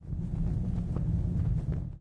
angelic defeat wind; smite; thanatos

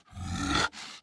zombie run; smite; npc; roman archer

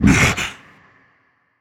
surprised; Smite; cabrakan

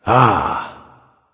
"ah", discover; smite; voicepack; ullr

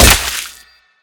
basic impact; smite; thanatos

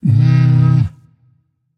nv tongue; smite; hachiman

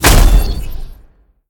aoe; smite; tiamat

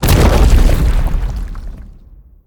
pounce land; smite; Anhur

